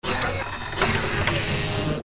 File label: machine scream